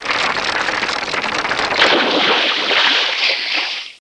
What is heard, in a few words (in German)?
Anker; Anno1602